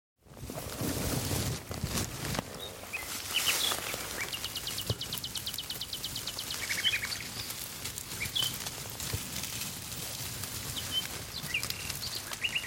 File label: interlude; pfft compost